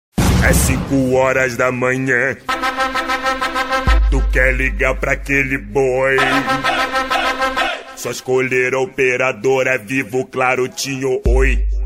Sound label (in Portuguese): funk do boi